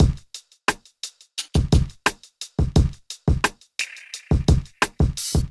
Kit, hip-hop drums; Specs; Golden Era; Ableton; Sound Oracle

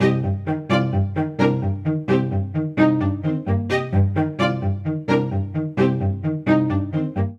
Phrase Somber C Minor 130 bpm, String Quartet; MIDI Clips; Ableton; Factory Packs; Spitfire Audio

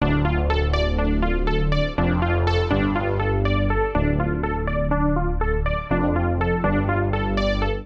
Poly Pad (D) 122bpm; Music Loops; Poly Magnet Pad; Ableton; Factory Packs; Singularities

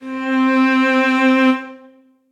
Long Normale - String Quartet; Instruments; Individual; String Quartet by Spitfire Audio

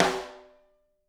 Overhead, Snare

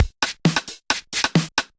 DMX Kit groove 133 bpm; MIDI Clips; DMX Admission Kit groove; Ableton; Factory Packs; Drum Essentials

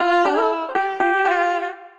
Sliced Melodies Root D, Sliced Vocal Phrases; Ableton; Factory Packs; Voice Box; Harmonic Vocal Racks

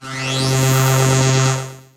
Synth Rhythmic; Ableton; Samplification; Planetary

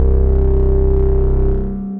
Harmonic Drone; Instruments; Brooding Bass; Ableton; Mood Reel

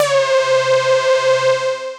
Nervous Thrill, Synth Lead

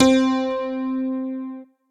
Piano; Ableton; Beat Tools; Mister M Piano

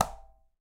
Quinto A Slap Mute, Latin Percussion; Drums; Drum Hits; Conga; Ableton; Factory Packs

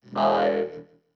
Neo-Wave Vocoder, Pop Rock

